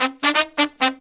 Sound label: Horns, 132BPM; Zero-G; Phantom Horns